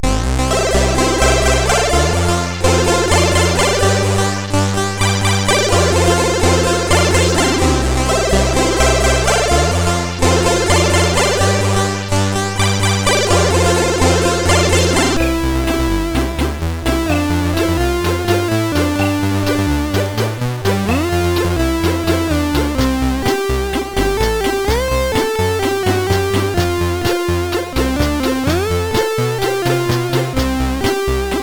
Music Samples, sid, CSG8580R5

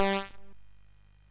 Shuffle, MOS6581R2

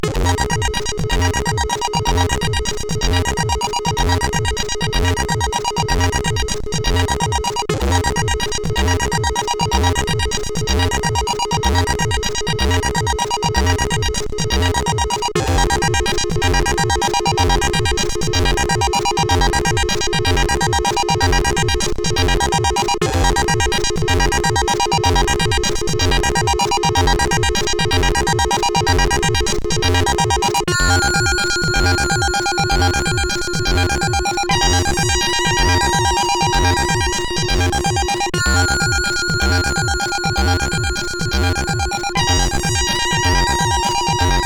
Tranc2, CSG8580R5

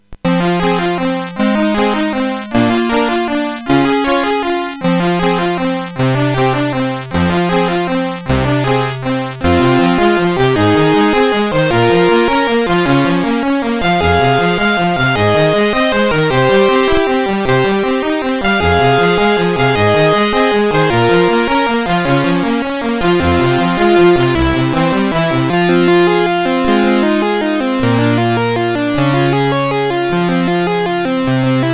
House, sid CSG8580R5; Klose Thorsten

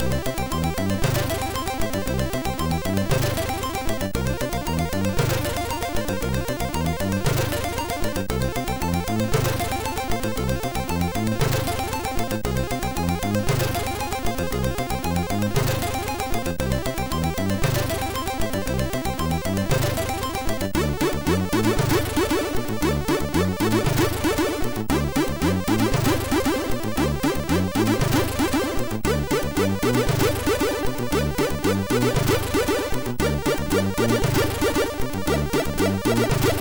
Melo Funk, MOS6581R2; sid

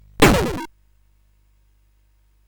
Dark Castle, CSG8580R5

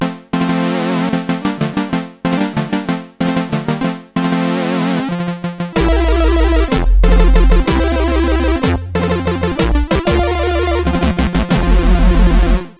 Fanfare, CSG8580R5; sid; hvsc

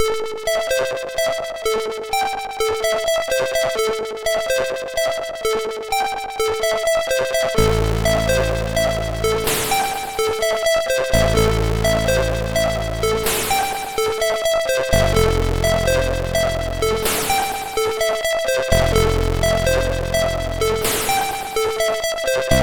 Dreamy Bells, sid MOS6581R4

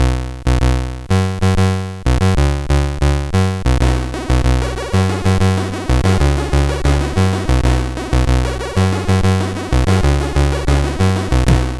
sid CSG8580R5; Muzik

